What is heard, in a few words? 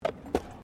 Tram Horse Drawn Horse Step Gravel; Horse drawn tramcar; Carriages (Sound Effects:Vehicles)